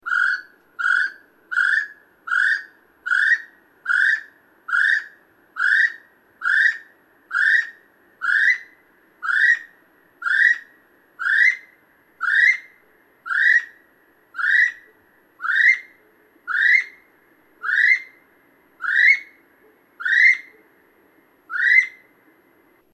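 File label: Lower Tieke female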